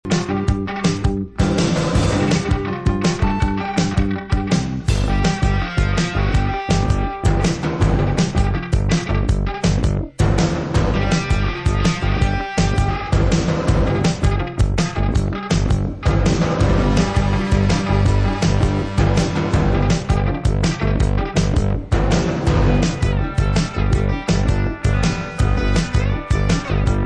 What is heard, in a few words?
Instrumental